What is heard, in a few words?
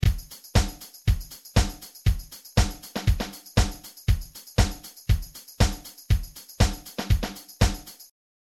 Disco